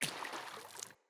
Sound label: Sandals Run Water, Footsteps; Player